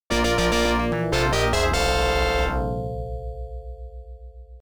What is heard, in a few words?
Unused Jingle